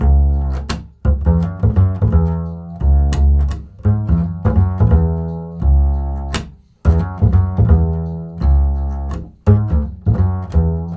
acid C#, 85 acid swing, bass; Spectrasonics; Bass Legends

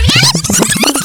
scratch; E-MU Formula 4000 Series Vol. 1; Hip Hop Nation; Bonus Perk

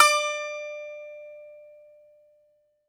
BAGLAMA, Plucked Strings

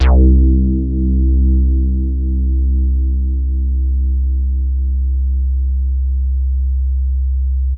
Big Synth Basses

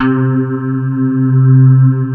STRIA, Twisted Textures; STRYNGI

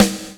snare disco; Roland L-CD701; Snares 6; Sn Modules 6

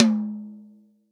REAL DRUMS POP KIT

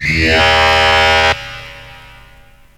VOX GENERAL; AMG Megabass REMIX! VOL-2

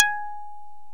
HARP KARPLUS

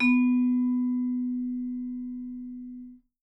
B3, Percussion & Harp; Miroslav Vitous

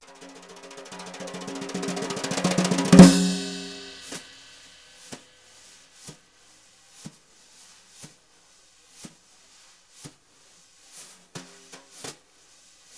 BRU, BRUSH; Univers Sons; Jazzistic

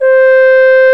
Trombone; Solo Brass

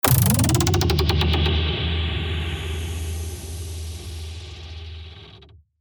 Door creak growl, bass

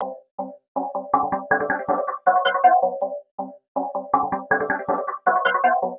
Seq Elves Moving, Drum N Bass, Keys Pads; Sonic Foundry (Sony Creative Software); Knutrix Drum & Bass

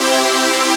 Synths Root C

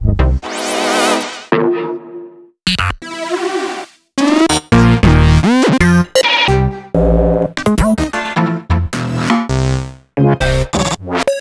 Synth Sounds

